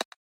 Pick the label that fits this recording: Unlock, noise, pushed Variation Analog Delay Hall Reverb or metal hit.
Unlock